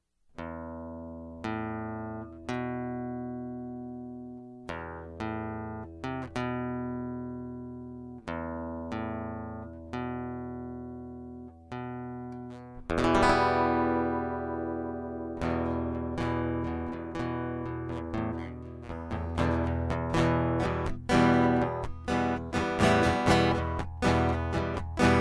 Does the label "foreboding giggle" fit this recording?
No